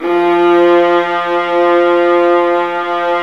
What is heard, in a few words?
Vas2 Bright; Roland L-CD702; Vlas Sect1-2